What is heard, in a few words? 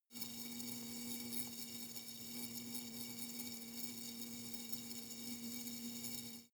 hornet hum glass constant soft